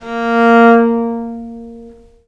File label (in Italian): A#2, Historical Instruments, VIOLE MUT; USB Soundscan vol.23; AKAI